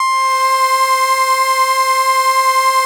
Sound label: Atmo Pads; Best Service; XX Large